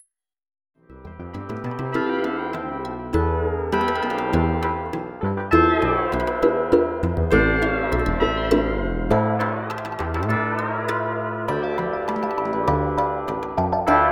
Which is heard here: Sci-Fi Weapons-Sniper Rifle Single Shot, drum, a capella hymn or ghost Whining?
drum